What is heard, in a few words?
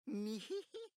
Neeheehee; Kokichi